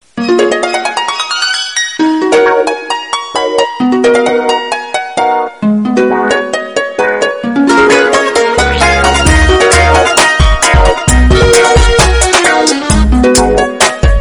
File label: punjabi instrumental